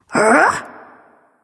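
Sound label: nonverbal surprise; smite; ratatoskr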